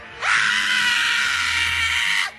scream

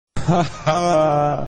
autotune laugh

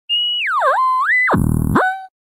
screaming; hatsune miku screaming

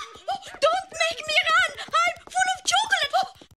scream; Uter – Don't make me run I'm full of chocolate

